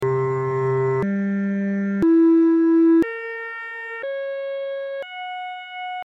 microtonal variations of the all interval chord akkord c g e b cis fis; akkord 6 c1 g1 e0 b- cis-1 fis-1 sukzessiv